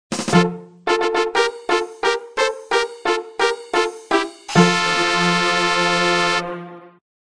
Attack level up

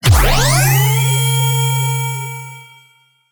Low Cinematic Impact

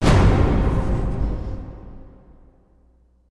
final wave; Plants vs. Zombies

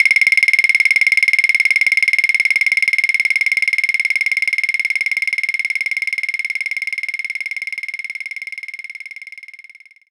claves; knights-try

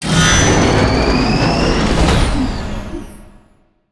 Field Mat Tap; Yu-Gi-Oh! Master Duel

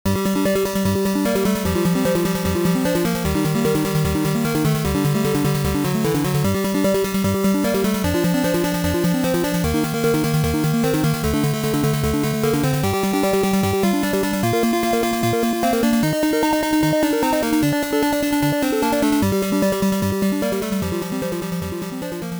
BGM ~ Boss Clear

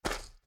Footsteps Swamp; We Are The Dwarves; Dwarf

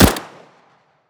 Cz75; Counter-Strike Global Offensive; PS3 Windows Xbox 360

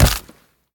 scrat hit bone; Rapids

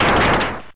High Horse Power

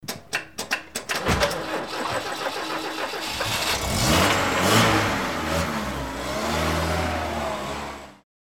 Truck Start; a dump in the dark

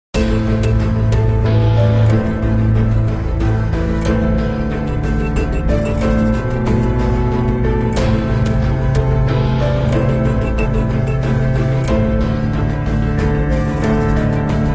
Battle Theme; Canto Iv Battle Theme D1

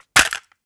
Cassette Rattle

